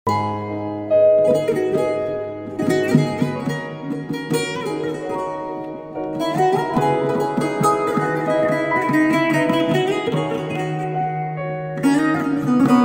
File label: saz trap